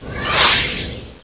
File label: flag wisp; quakerepo fortress items